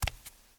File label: Step concrete barefoot